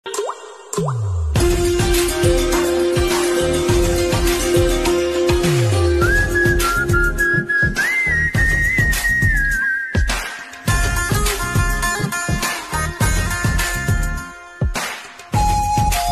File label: new ringtone ringtone hindi ringtone caller tune lofi ringtone ringtone rap; sadabahar